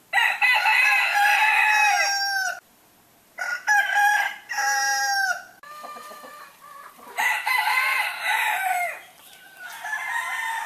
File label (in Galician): son galo; animais